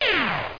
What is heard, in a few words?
tile